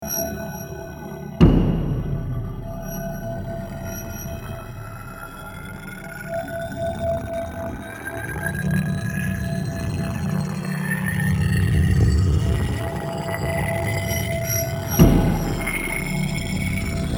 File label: Wind Chimes